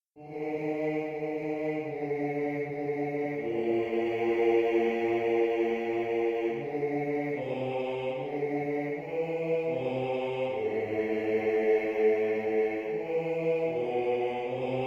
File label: Bari, barbershop tags